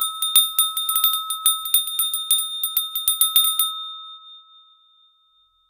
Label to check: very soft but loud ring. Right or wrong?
Right